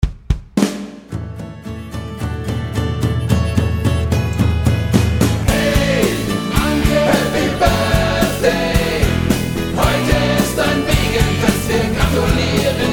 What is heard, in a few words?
Happy Birthday Anke